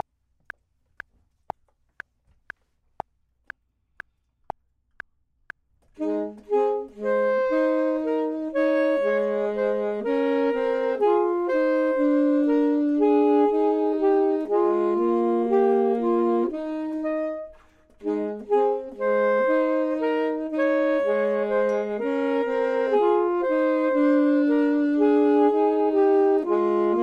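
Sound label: both, Saxophone and Clarinet; voxman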